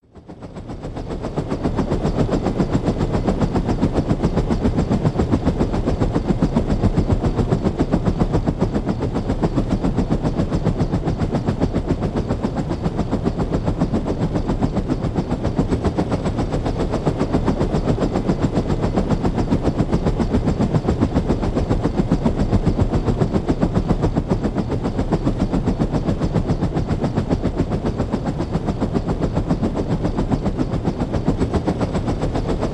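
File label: Heli Blade Whops Slow, helicopter; AH-64 Apache Blades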